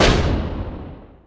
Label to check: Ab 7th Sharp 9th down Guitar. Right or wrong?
Wrong